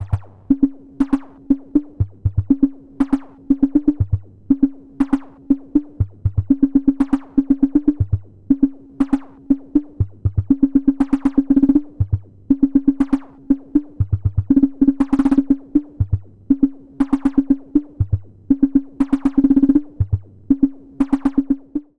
Dreadbox Drips V2

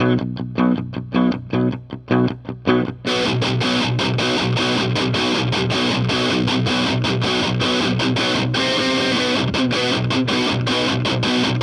Boss OD 200 X Metal

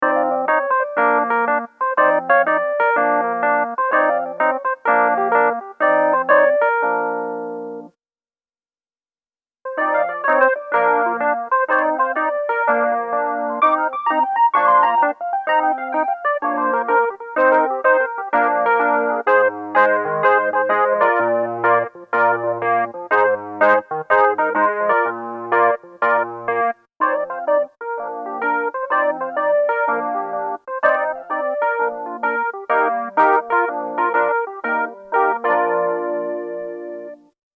Reeds Medium Chorus; Boss CE-1

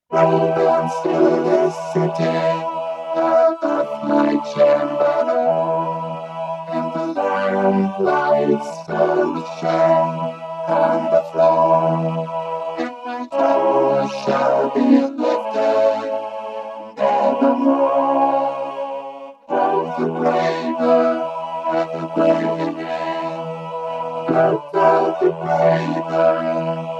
VP330 Vocoder; Raven

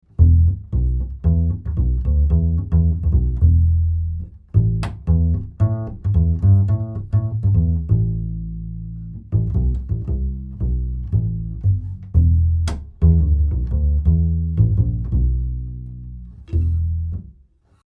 Schoeps CMC64 Upright Bass 20cm

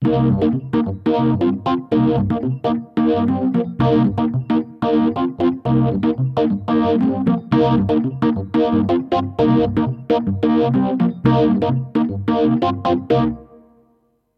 EPiano Phaser Overdrive Reverb

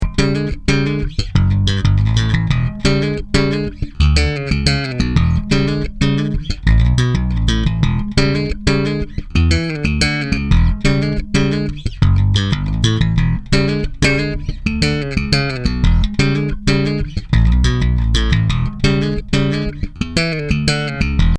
staccato; tc electronic

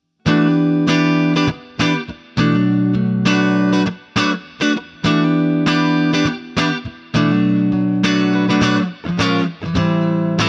Fender Clean Neck PU

